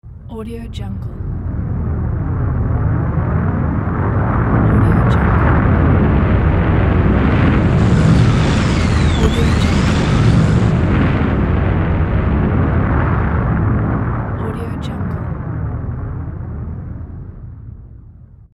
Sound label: spacecraft launch